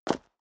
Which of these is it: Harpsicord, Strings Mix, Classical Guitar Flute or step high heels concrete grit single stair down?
step high heels concrete grit single stair down